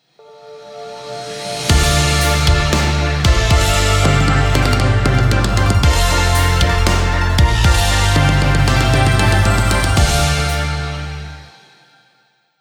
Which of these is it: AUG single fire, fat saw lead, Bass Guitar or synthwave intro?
synthwave intro